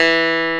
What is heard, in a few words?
clavinet; E-MU Formula 4000 Series Vol. 3 – Analog Odyssey; VK+ Instruments